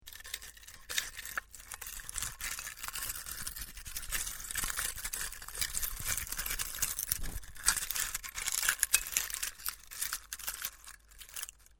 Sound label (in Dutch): muziekdoos - blaadjes